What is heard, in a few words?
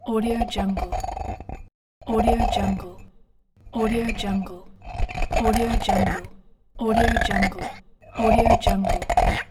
squeaking dishes; albasfx